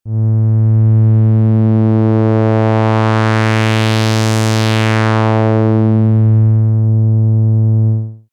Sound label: LP Filter sweep 6581; SIDizer